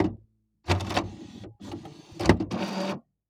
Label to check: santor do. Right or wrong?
Wrong